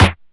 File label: Hit Snare, DIGITAL KIT